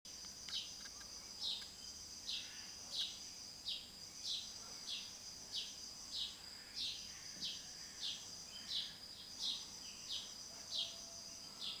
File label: anamur dawn garden ambience